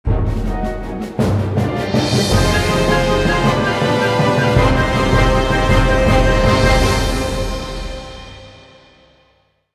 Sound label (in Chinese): 大气的背景音乐